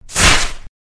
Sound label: harpoon